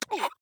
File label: Death; Knight